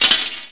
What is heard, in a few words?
brass metal; SpecOps2 MPlayer Demo